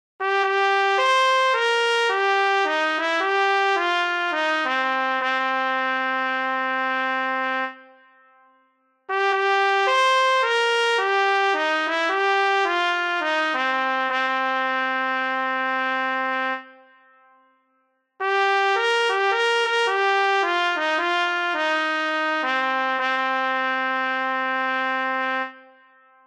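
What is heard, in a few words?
solo Trumpet; Trompete in B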